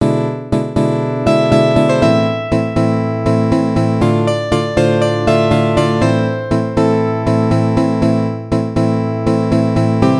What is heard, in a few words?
summertime outro repeat; アウトロ（エンディング）